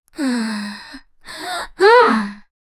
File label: female grunt pain